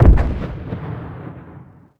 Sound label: tarantula explode far